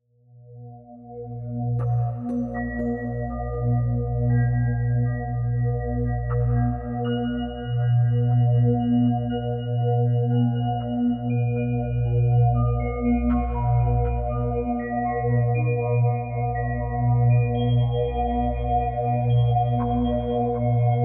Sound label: Soundscape; Deep Healing Series